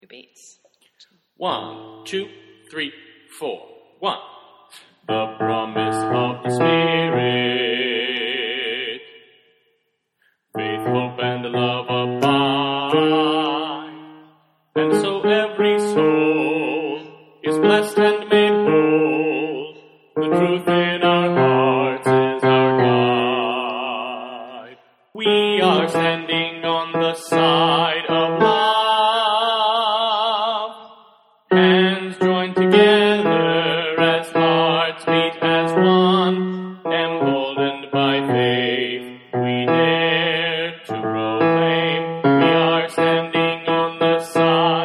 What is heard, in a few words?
Bass